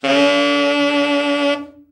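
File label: TENOR overblow, Sax, FALL; Giga Samples Collection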